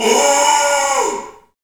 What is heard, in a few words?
Bullhorn, Afro Horns, Africa